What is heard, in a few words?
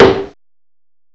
STICK, DRUMS; M8; CMI Factory